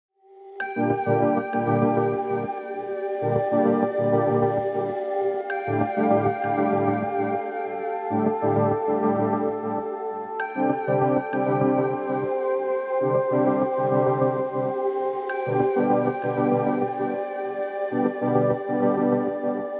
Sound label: INSTRUMENTAL; Roland Cloud